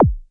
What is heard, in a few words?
stomp bass, Roland SH09, drum machines